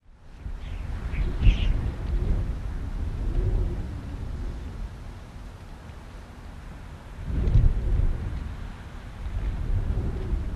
wind, whistling

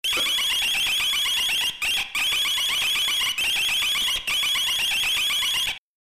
Alarm Chirpy Electr; hi-tech-various

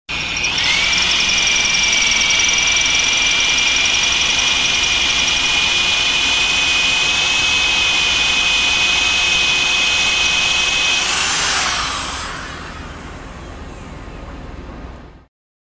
Hi Speed Drill Press; Motor Noise; Bit Squeaking In Wood; Bit Removed, Motor Spins Free, Fan

